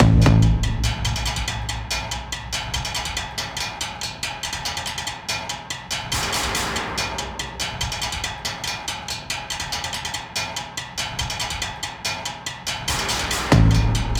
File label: Music Drums For Sniper Ambush; Battlefield 3